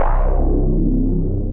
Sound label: Bass, Synth Bass; Ekoplanet Bass; Ableton; Factory Packs; Beat Tools